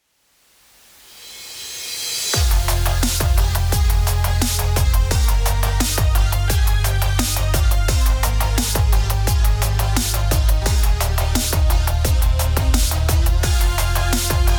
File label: Soft Dnb; Cyber Hook